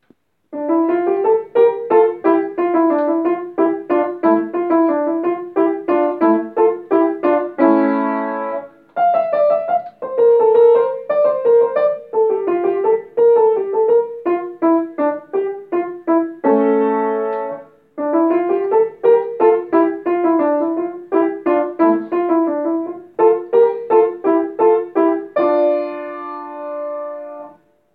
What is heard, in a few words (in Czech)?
Etuda